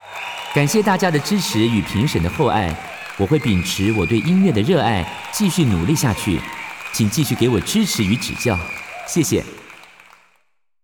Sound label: music